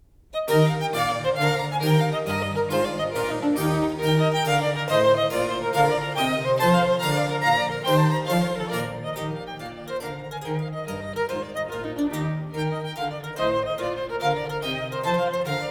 Trio Sonata For 2 Violins And Basso Continuo No.7 in E flat major; Vivaldi